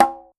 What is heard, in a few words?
Yamaha RM50 High Conga